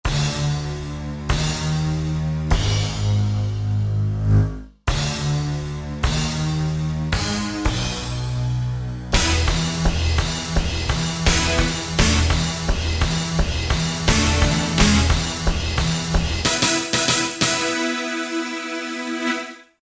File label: Orch Stab, Roland JD-990; Factory Patches